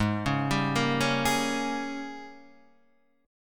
G sharp Major 7th Suspended 2nd Suspended 4th Guitar Open D